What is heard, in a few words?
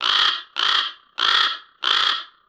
bird caw long